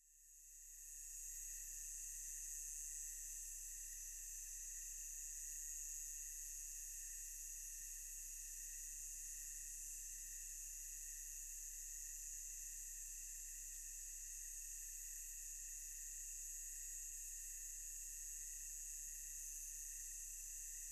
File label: Multi Cicadas Cricke, cicadas